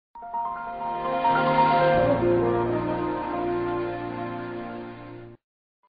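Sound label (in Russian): windows 2000 приветствие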